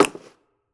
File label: Snare